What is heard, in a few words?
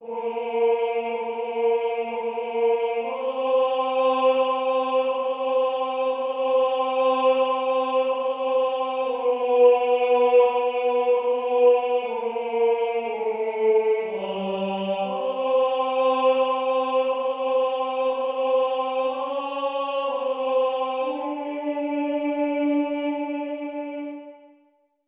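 Baritone, barbershop tags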